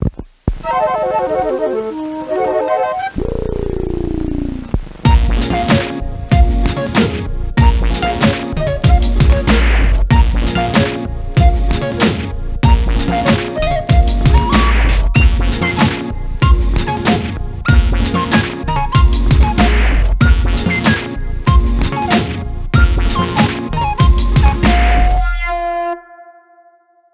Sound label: jingle; deadline23